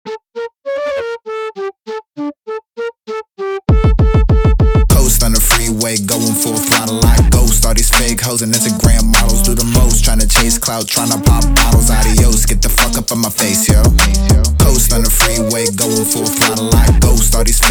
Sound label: Hip Hop